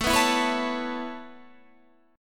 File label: C sharp Minor 7th Sharp 5th A